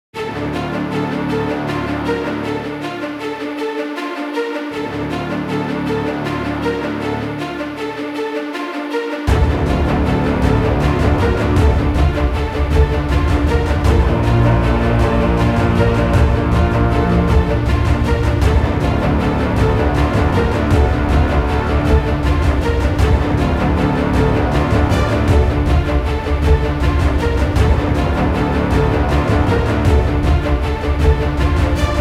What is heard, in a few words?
Epic Trailer Music